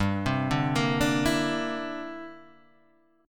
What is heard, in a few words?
G 6th Suspended 4th Sharp 9th, guitar chord; G6sus4#9 arpeggiated; JGuitar